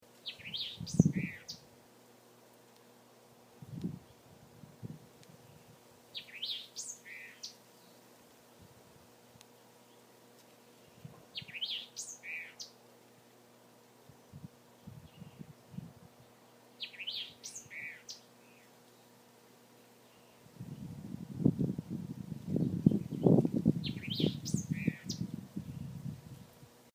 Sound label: thick billed vireo, abaco bahamas; calling